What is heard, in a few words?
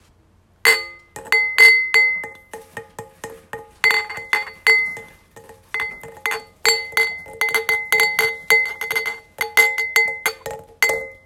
foley glass cans